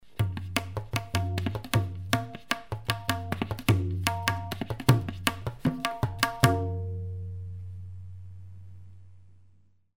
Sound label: Tabla solo